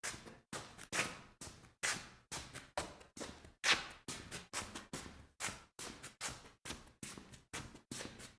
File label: scuff on concrete series